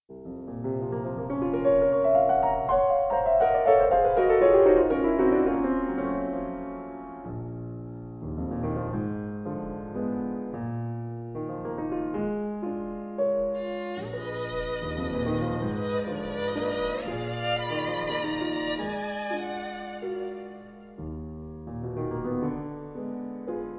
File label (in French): Duo pour violon et piano